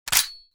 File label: mp5 40cal bolt forward